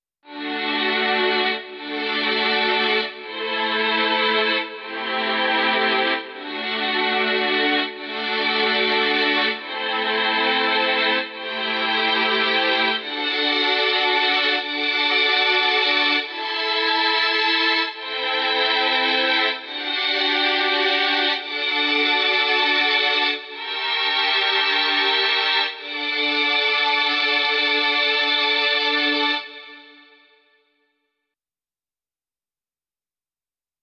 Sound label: Korg M3